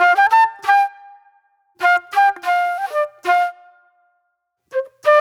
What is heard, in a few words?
J-Funk Flute, Call And Response